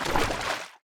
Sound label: slosh, footsteps; Garry's Mod; tf2